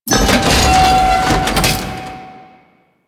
Thumper Part Pickup; Grineer Forest; Warframe; Veilbreaker; Kahl

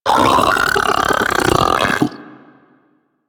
Arachnoid Vocal Patrol; Warframe; Corpus; Arachnoid Hunger

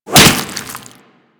Infested Whip Impact Default

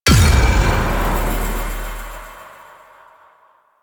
Hit React Quick; Hit Reacts

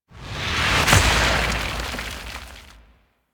Jump Attack Land Explosion; Enemies; Warframe; Infested; ZealoidPrelate

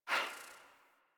UI Theme Error; DE; Warframe; Zephyr

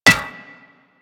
Melee Staff Impact Generic

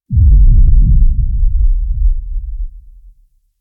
Undercroft Sub Sweetener, Ambience; Warframe; Duviri

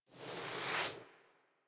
Puppy Foley Sit Idle To Stand Idle; Warframe; Kubrow

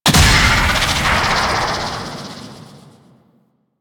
Grenade Explosion Blast; Enemies; Warframe; Infested; ZealoidPrelate

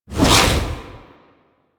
Wraith Reaper Double Jump; Warframe

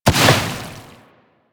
Bubblegun Pistol Hit Explosion; Warframe; Weapons; Tenno

